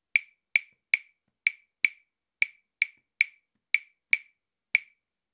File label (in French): clave de son ternaire 3+2